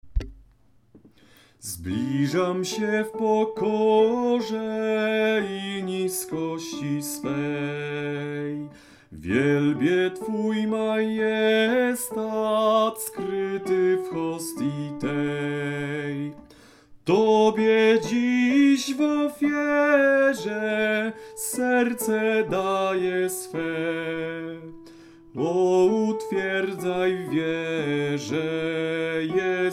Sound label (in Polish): Zblizam sopran